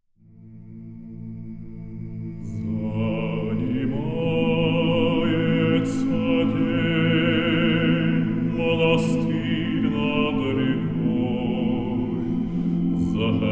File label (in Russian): Хор